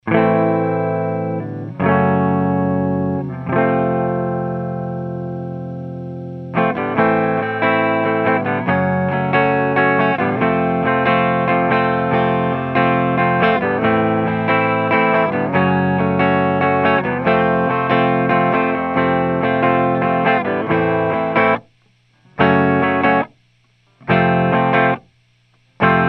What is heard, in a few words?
AMP Clean Gemini 5 Tube, clean eguitar